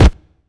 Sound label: huge kick b key